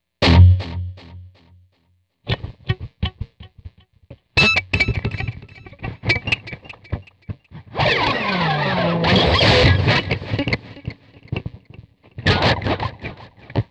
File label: guitar intro